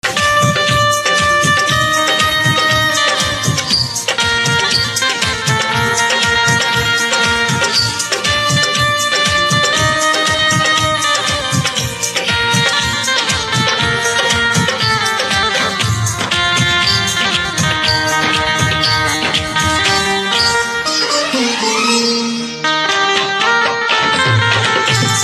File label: Banjo Instrumental Ringtone